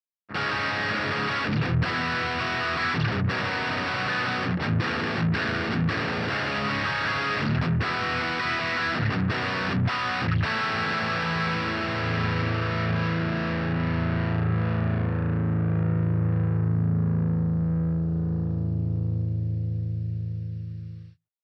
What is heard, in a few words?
bias 100, extended drive